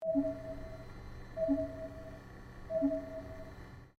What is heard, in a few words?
submarine; the whiring of a computer layered with the ping of my computer warning signal